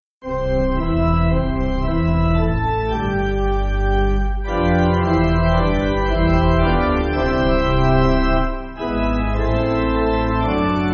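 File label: Pipe Organ, church backing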